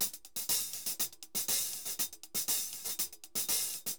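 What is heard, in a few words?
hi-hat, DRUMS SAMBA; Best Service ProSamples vol.14; AKAI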